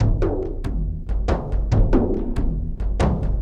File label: Boong Khaga Boong Ahgaga, Frame Kendang; Sonic Foundry (Sony Creative Software) - Friendly Fire; Drums; Long